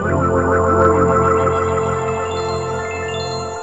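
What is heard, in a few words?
majestic chord, pads; instruments single samples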